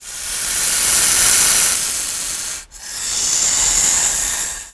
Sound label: snake hiss, ships engine; Quantum Redshift; XBOX; yuri